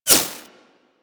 Laser Impact Metal; Warframe; Weapons

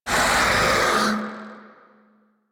MITW Triangle Pain B; Warframe

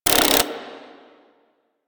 Hack Minigame Cursor Move

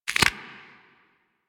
Load Arrow, Weapons; Warframe; Infested; Inf Cernos

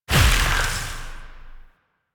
Void Release Heavy; Weapons; Warframe; Duviri; Incarnon Adapters

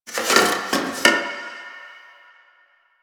Box Of Trash Rustle

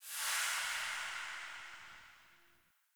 Shrine Activate; Objects

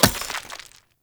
Throwing Knives Impact Rock; Weapon Impacts; Player; Environment

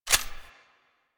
Bow Latch

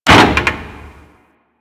Derelict Mover Metal Clunk; Ambience; Props; Warframe; Orokin Derelict

